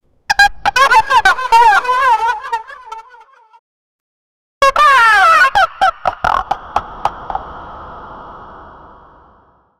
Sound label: advantures background, adventure